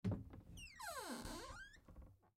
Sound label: Bathroom Cupboard, Cupboard Open Door Squeak; Household; Blastwave